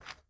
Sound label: ui pickup bone; Rust